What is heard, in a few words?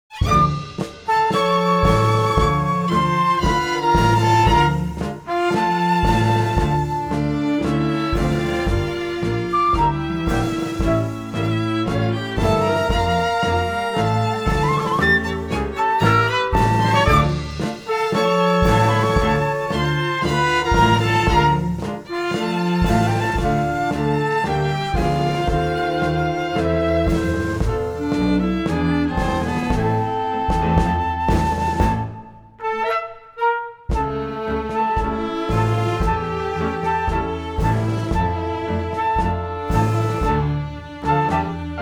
Tango